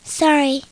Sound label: bummer